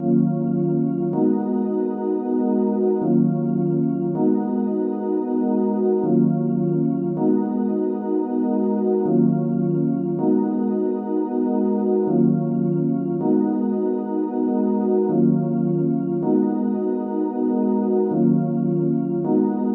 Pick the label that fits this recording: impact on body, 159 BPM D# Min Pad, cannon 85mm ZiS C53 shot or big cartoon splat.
159 BPM D# Min Pad